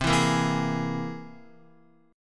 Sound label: C Augmented 7th, Guitar; strummed; JGuitar